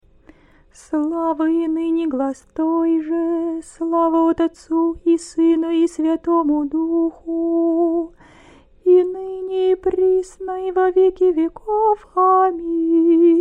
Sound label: great thursday stichera, singing